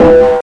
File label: SNAR; USB Soundscan vol.11; Drums Dance & Groove; AKAI